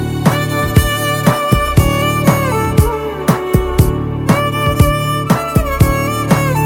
Guitar Song; Balupu

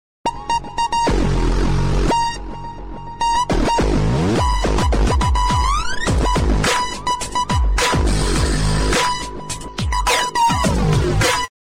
Nokia Sms; Nokia No1

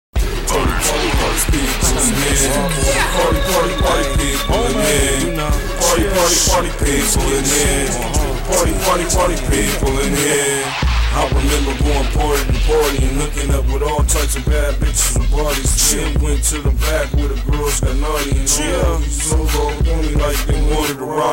Party Peeps

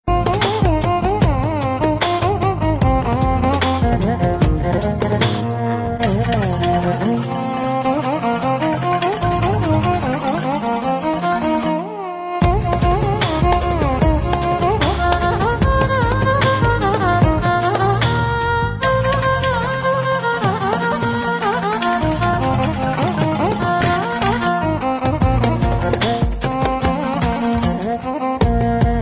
Violin Fusion

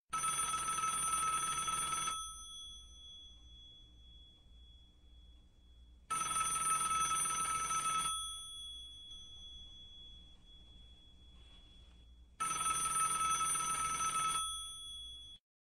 Old Style Ring Tone

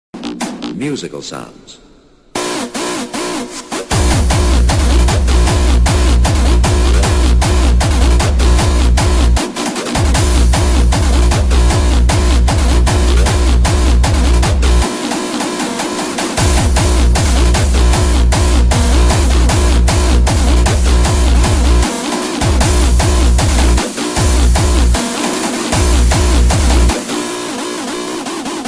Hardstyle

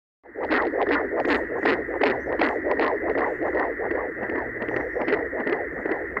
Baby heartbeat

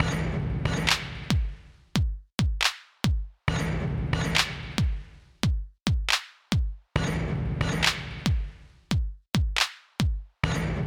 Drumz

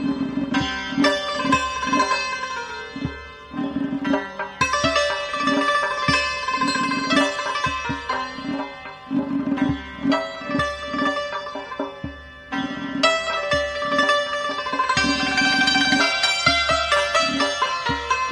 Iranian Santoor

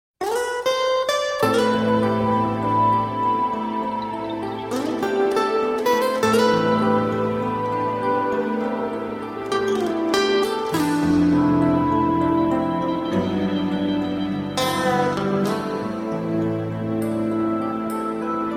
Romantic Guitar

